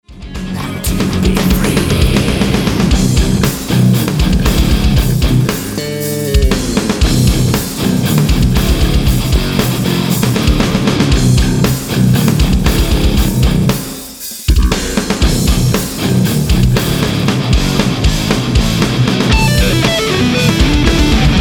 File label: Darkness solo (Bulldozer- Modern High Gain)